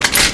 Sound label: AR mag in; dahl; hyperion